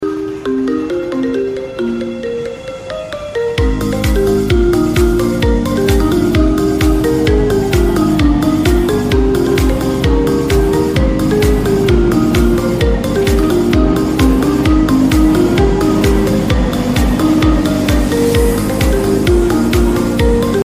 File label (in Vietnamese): nhac chuong iphone remix tiktok nhe nhang